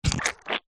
human baby poop wet squelch

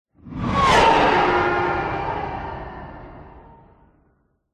science fiction space vehicle whoosh past

